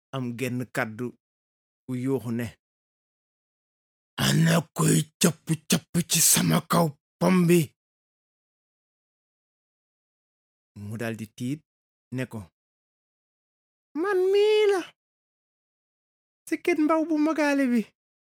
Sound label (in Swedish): bip; wolof